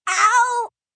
Pain Whiny Ow, Ow, Ouch, 1 child, in pain, whimper; Voice Box